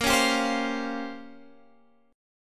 Bb Minor 6th Add 9th Bb down Guitar Half Step Down